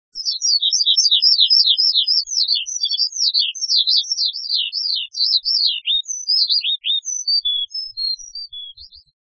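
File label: skylark; hibari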